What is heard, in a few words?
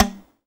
TZOU PIZZ G 2; Soundscan vol.53; Mediteranean Traditions; AKAI